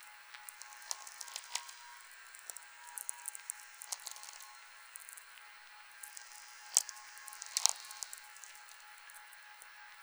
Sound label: Meteor filtered